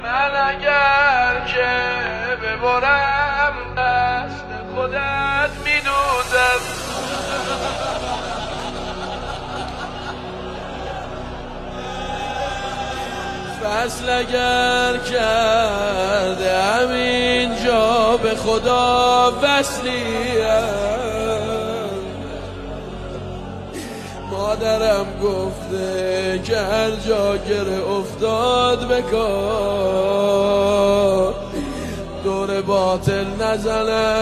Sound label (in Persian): مداحی